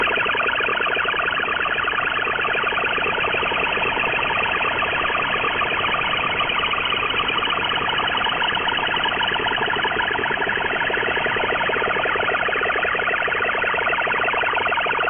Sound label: CIS MFSK